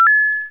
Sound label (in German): right abgabe sound